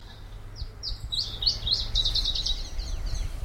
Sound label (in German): Iberienzilpzalp